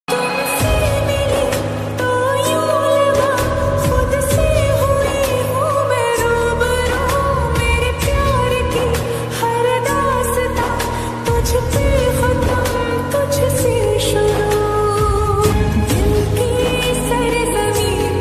female version ringtone female ringtone lofi ringtone